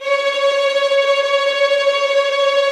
TREM C#5, Violin; Q Up Arts; Denny Jaeger Master Violin Library; PERFORMANCE

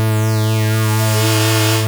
OB 18 A3, Rare Synthesizer; Hollywood Edge - Giorgio Moroder Rare Synthesizer Collection; OB 4 VOX.

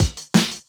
175, Breaks; Drum&Bass; EZ Rollers

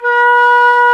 FLT XFLT, Flutes; Club-50 - Foundations Roland

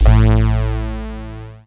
dance, bass; Techno Trance; Techno Trance Essentials

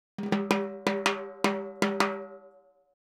Timbali, Latin Percussion

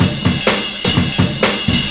CYMB, Breakbeats

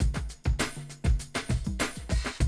drum loop, 100 BPM

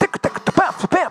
VOX, Roots Disco; USB Soundscan vol.32; AKAI

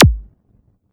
Quasimidi 309, Drums

